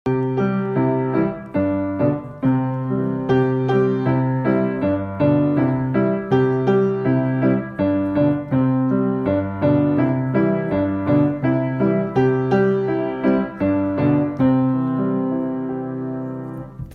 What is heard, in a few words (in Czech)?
klavir; S Puntíkem a Pepinou za vílou Poupěnkou; jaro; barevné velikonoce